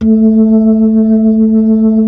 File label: B-3